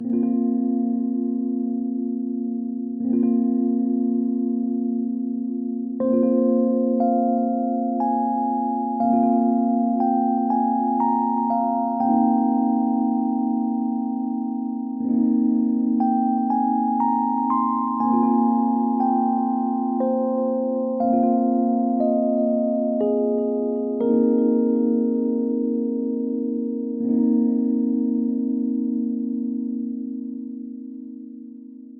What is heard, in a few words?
Quiet Water